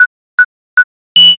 countdown

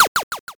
shot

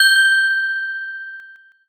handbell

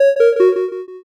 picosad

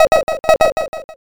frog

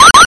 warning

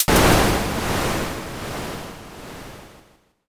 thunder

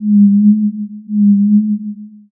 owl

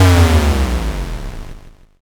explosion m

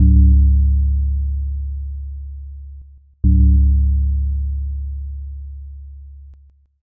belltoll

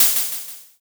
open hat

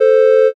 horn

